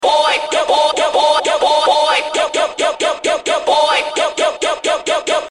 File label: vocal